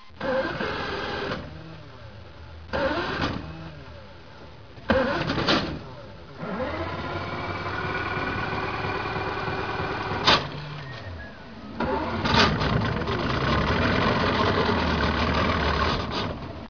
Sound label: nautical